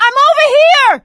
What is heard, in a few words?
ledge hang end; Left 4 Dead 2; producer